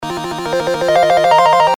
rollup alternative; Shamans Dream 2

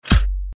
nudge reel stop; Step Back 7's; nudges

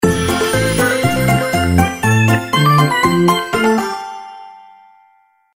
big Win; Fluffy Favourites Remastered; win-levels

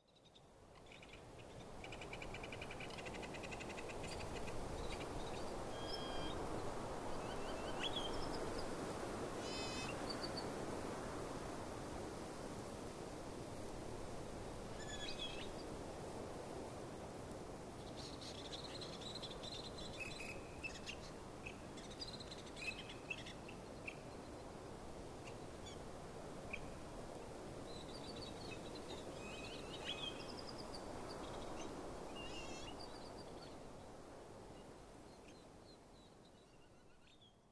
bg ambience; Stampede Call of the Pride; Eyecon

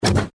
reel stop; Hot Off The Press; Eyecon

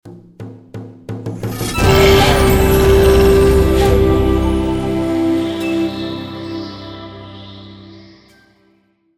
bonus Prize you Won Reveal wolf; Shamans Dream 2

